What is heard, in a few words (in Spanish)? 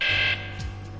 fallo